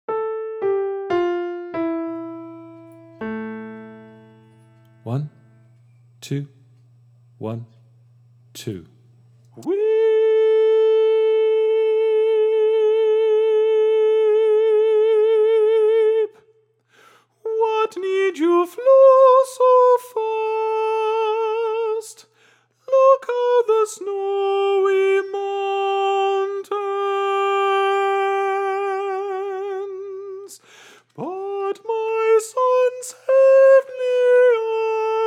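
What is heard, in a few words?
Soprano; Rest Sad Eyes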